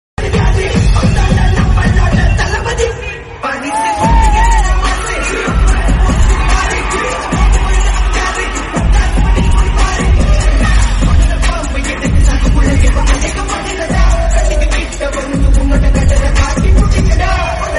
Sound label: hip hop English song tone ringtone